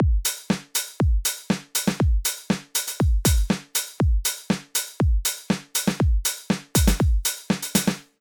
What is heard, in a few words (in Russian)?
rhythm, В целом должно получиться следующее; Закрытый «хэт» создаёт дополнительную пульсацию